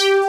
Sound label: FUNKY LD; Pro-Rec - Synthsonic; BASSES